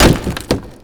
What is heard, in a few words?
pot breaking sound